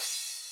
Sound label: Small Cymbals